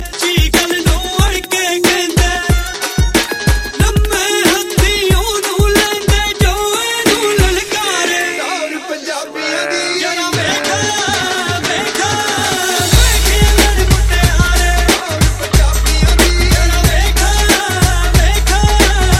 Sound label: Punjabi